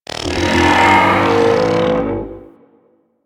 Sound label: Arachnoid Camper Step Movement; Warframe; Corpus